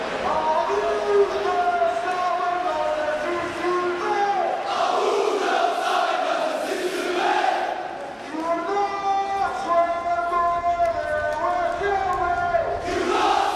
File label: Chants, lisbonne